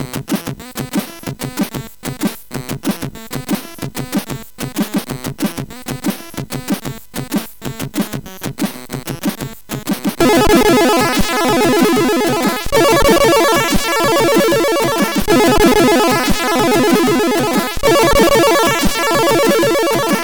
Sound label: Quicky Intro, MOS6581; hvsc